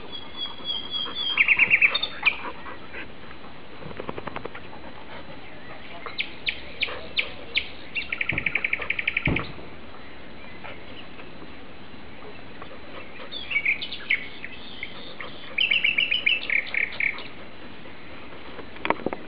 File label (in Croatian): Slavuj; Vugrovec